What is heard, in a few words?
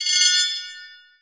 Menu Magic